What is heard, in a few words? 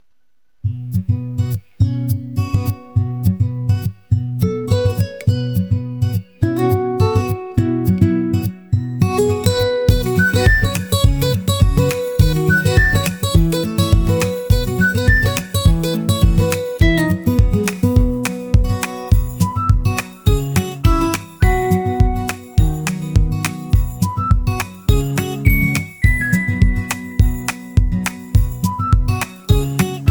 morning bright